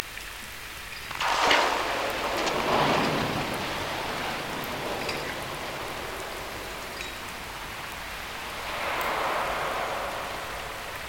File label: detonation, noise; MWWG noise indicator